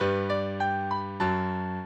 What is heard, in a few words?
minuet, mozart